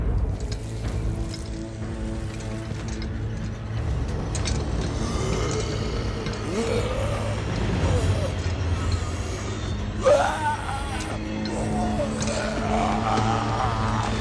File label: lab escape fail